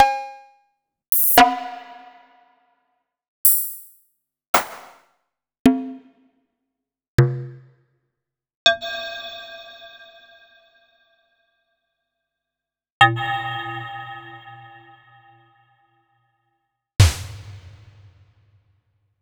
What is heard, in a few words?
Access Virus TI